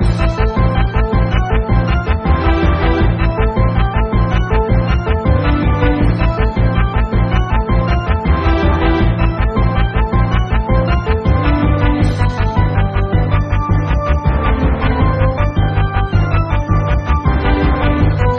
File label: battle; Mercenaries Saga 2; Android